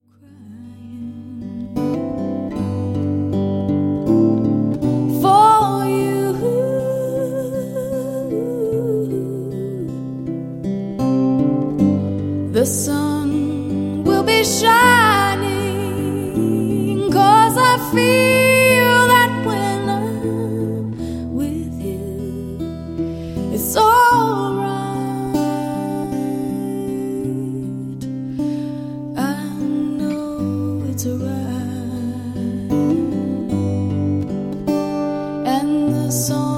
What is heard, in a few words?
acoustic